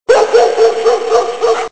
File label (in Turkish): köpek, hayvanlar